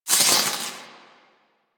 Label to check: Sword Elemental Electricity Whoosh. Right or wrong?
Right